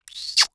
Human Male Kiss

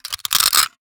Horror Scary Bone Grind

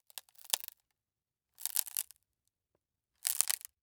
Crash Wood Tear; crashes; AdobeCC

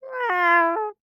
Animal Mammal Carnivore Cat Domestic Cat Meow Sad; animals; AdobeCC

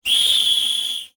Multimedia Internet CD-Rom Flash Animation

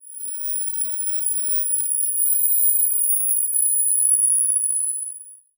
Title Transition Crystal Eerie; Production Element Title Transition

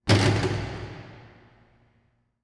Sports Basketball Hitting Rim In Gym

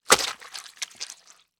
Food Squish; household

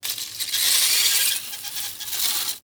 Foley Tree Branch Scrape Cement

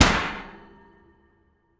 Household Oven Door Slam Closed

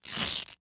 Foley Velcro Unhook

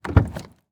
Truck GM Envoy Open Door Interior; transportation

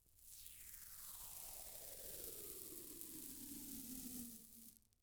Industry Tool Tape Electrical Tape Peeled Back

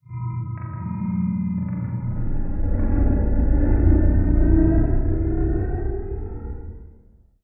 Transition Stab Dark Ghostly; Production Element

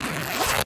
Zipper On Golf Bag Zipping; Sports

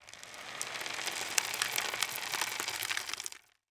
Foley Rock Salt Sliding From Plywood Into Bucket

